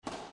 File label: ground